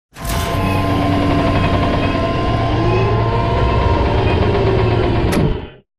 Sideways Town Giant Floor Panel Lower Rotate Servo; Boomtown; Titanfall 2